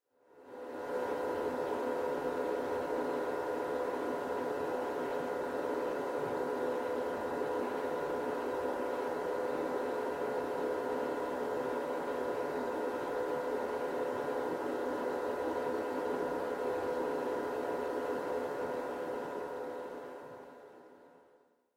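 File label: Washer Front Load Operation Noise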